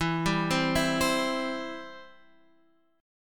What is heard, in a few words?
E-Minor Sharp 5th, Guitar, Half Step Down